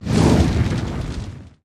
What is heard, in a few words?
Dragon Fire Whooshes With Fire Crackle Element